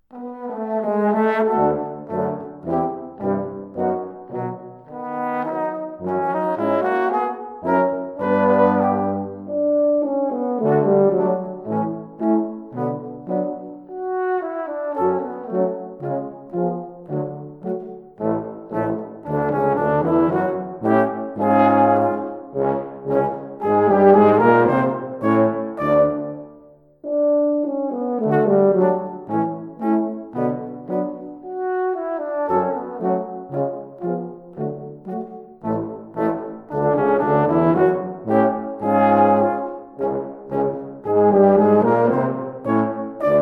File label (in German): Sinfonie Nr. 1 - III. Gavotta, altophonium